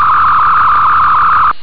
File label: phaser